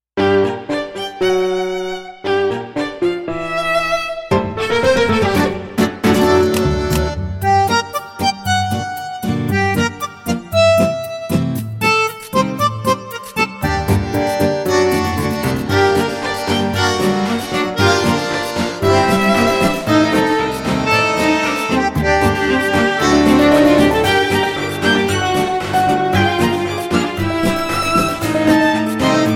Tango with Dice; Game of Dice

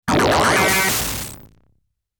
Bomber Explosion Sound; Zero Wing

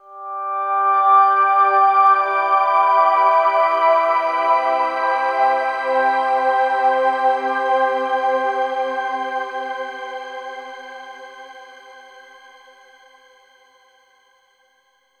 Overlay Tone

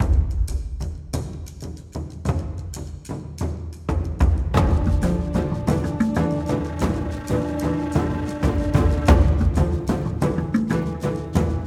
Forest Battle; Fell Seal: Arbiter's Mark

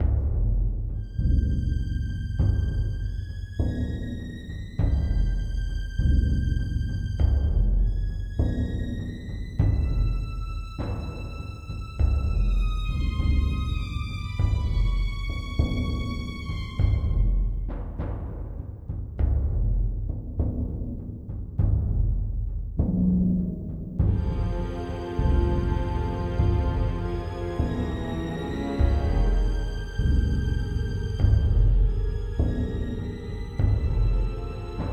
Suspense Loop; curse of atlantis thorgals quest windows gamerip 2002

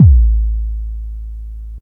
Bassdrum; rainbow laser disco dungeon

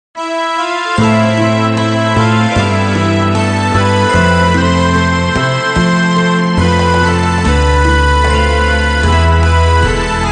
Music Menu event

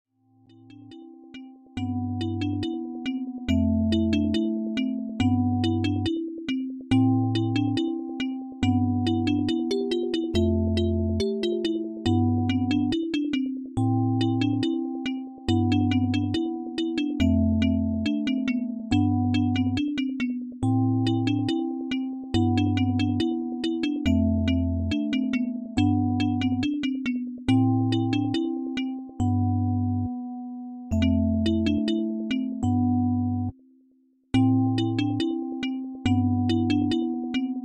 Music Box

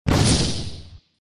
Sfx Wizard Attack Hit; Mighty Knight 2

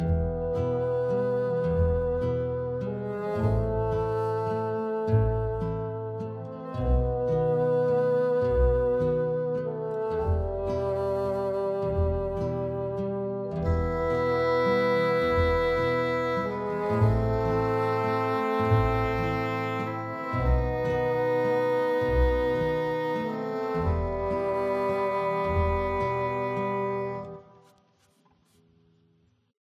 Horn; Oliveira Layer B; tintin reporter cigars of the pharaoh

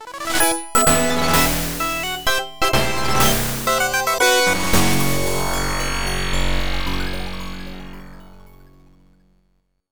boss defeat; shovel knight dig

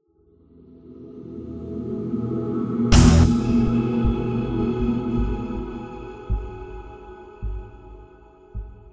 Transition End; Everspace 2

